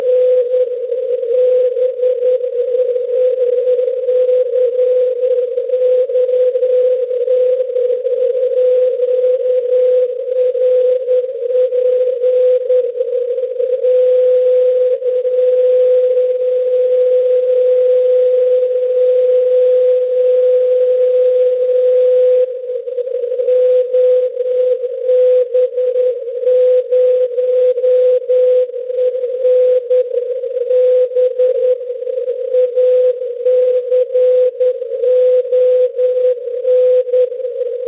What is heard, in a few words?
cw